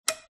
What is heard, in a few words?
open switch light; fox audio